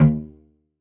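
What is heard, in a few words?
SOLO CELLO, Synclavier Strings; ILIO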